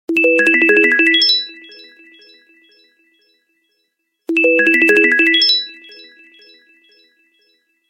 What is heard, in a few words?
samsung galaxy s23 ny, ringetone